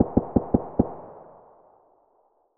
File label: hk416 tap fast; weapons; SPT Battle Ambience